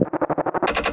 headcrab canister ambient; Half Life Model Pack; Half Life 2; ambient levels canals

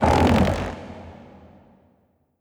strider, passby, detail rise, creatures; seq passby

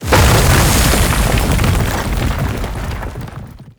dig down, antlion; creatures

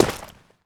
dirt, footsteps; player

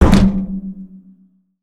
land metal barrel, footsteps; player

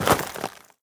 step light, footsteps player debris

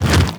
twitch, zombie blind, foley; creatures

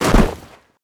land snow, footsteps; player

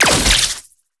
orb bolt

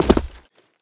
land, player

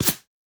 bullet by supersonic tail; weapons; nearmiss; Counter Strike Model Pack; CS2

